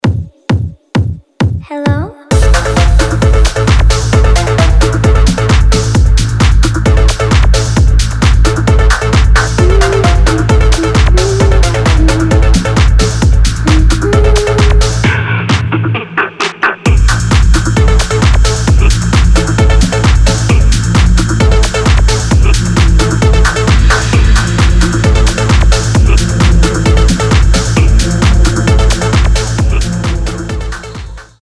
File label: techno